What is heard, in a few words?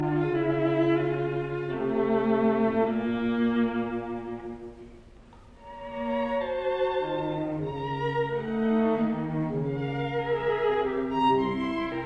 String Quartet in D major; III Andante con moto; Schoenberg